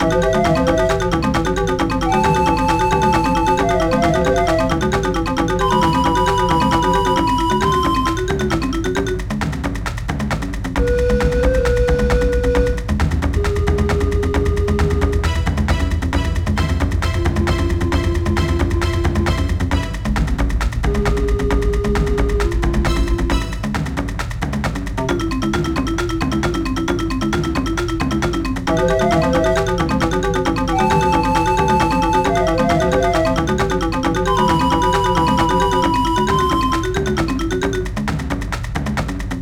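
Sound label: background music; My Frogger Toy Trials; Nintendo DS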